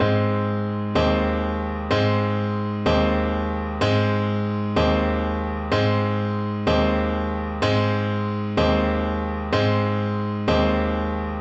Tenor Saxophone

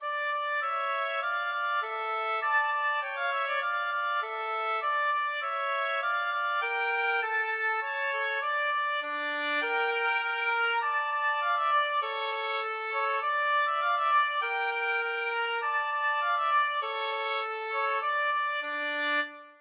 oboe duet

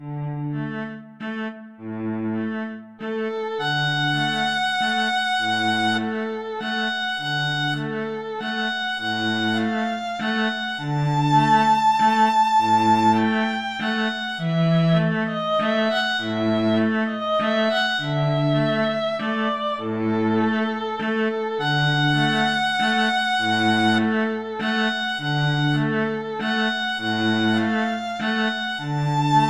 violin cello duet

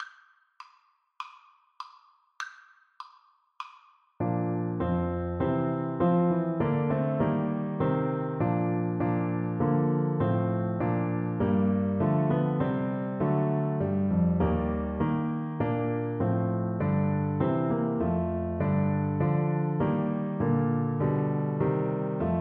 Trumpet

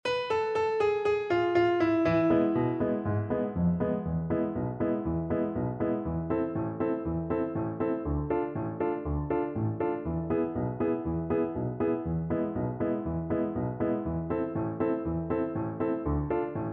et dodim kala viola

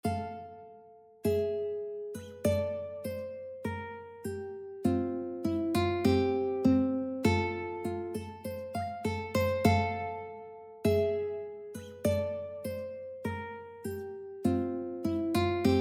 wedding march, ukulele

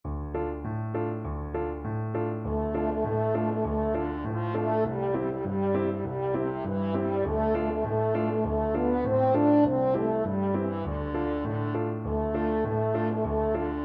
french horn

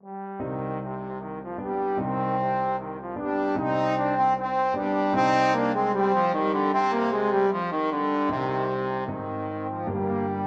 trombone duet

